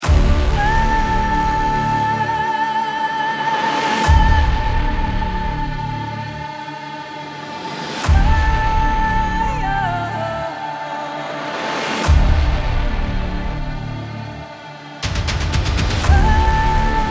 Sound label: D Minor, 120